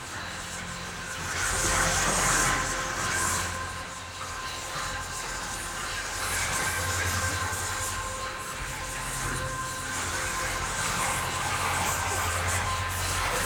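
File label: ship psionic ambient aura; Stellaris; shroud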